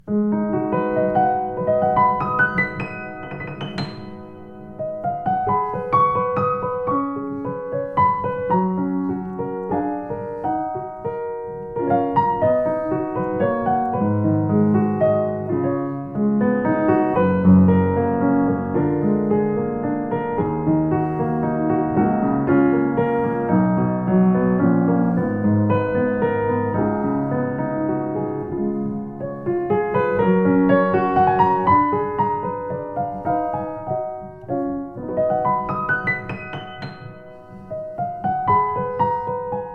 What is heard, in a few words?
Yamaha U1 H, piano